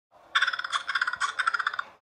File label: Numbat, حیوان نامبت